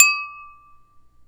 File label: GLASS DING, percussive